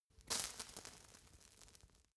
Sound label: fire torch sizzle tiny